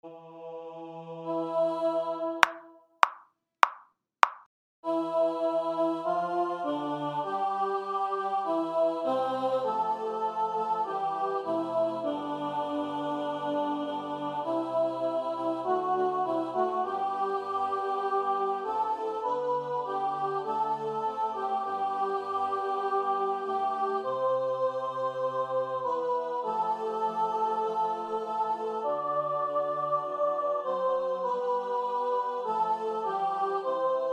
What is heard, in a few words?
soprano